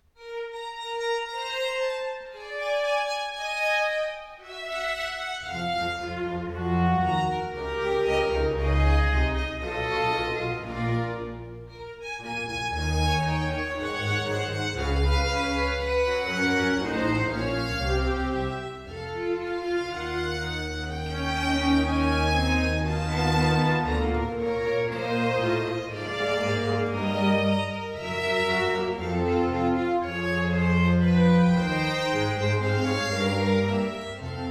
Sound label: Sinfonia in B minor for strings Allegro ma poco; al Santo Sepolcro; RV 169; A. Vivaldi; Concertos and Sinfonias; 尼古拉斯 · 麦克吉根